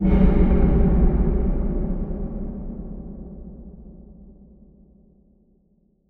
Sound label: Huge Verb Tail, Long Reverb Tails; impact samples